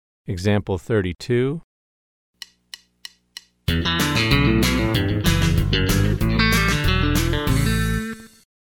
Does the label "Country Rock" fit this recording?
Yes